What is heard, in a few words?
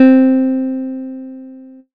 nylon guitar